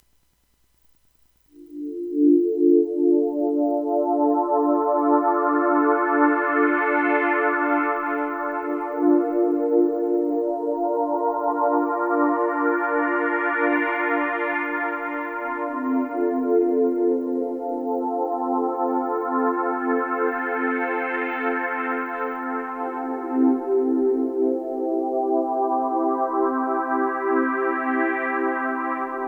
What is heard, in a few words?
relaxing